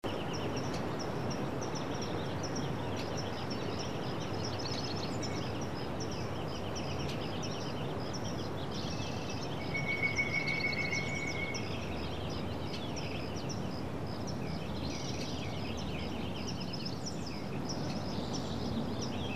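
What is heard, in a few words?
Ambience Birds Distant Meadow Traffic Loop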